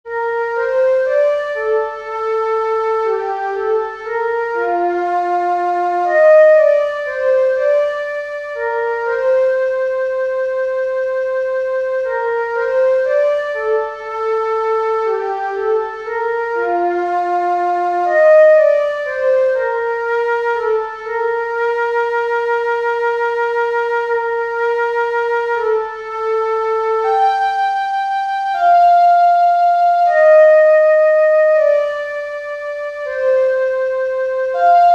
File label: flute